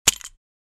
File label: Mouse trap snap